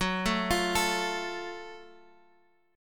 F sharp Minor Major 7th, Guitar Half Step Down

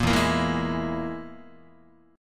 E Major Flat 5th, Guitar; strummed; JGuitar

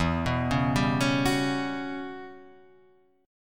E Minor 6th Add 9th chord

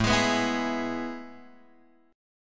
A Major 7th Suspended 2nd, chord; AM7sus2 Chord {5 X 6 4 5 4} strummed

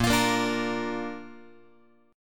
A sharp Major, Guitar; strummed; JGuitar

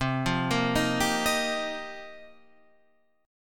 F 7th Suspended 2nd Suspended 4th

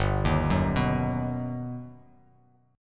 G Augmented Major 7th, Bass Standard; G+M7 arpeggiated; JGuitar

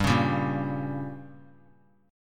G Diminished; strummed; JGuitar

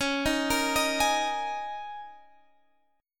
Eb Suspended 4th Db; Ebsus/Db; arpeggiated

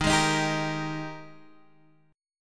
G sharp Major 7th Suspended 2nd

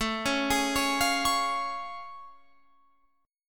A Augmented Major 7th A Guitar Open F; arpeggiated

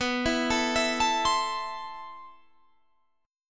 A Minor B, guitar; Am/B arpeggiated

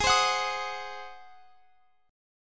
G sharp 7th G sharp, Guitar Open F; G#7; x,x,20,19,21,22; strummed

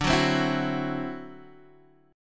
D 9th D down Guitar DADGAD

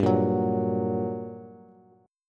G Minor Major 7th, down, Bass; GmM7 strummed; JGuitar

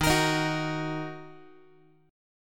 Eb Minor, Guitar; Ebm strummed; x,11,13,13,10,x; 4A,4E,5A,5D,5G#,6C#; JGuitar

